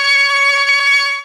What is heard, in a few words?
organ F, PIPE ORGAN; ProSamples vol.55 - Retro Sampler; ORCHESTRON